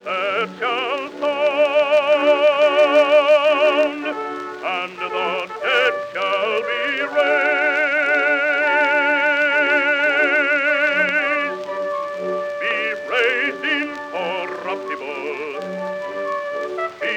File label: The trumpet shall sound; Messiah; loc-edison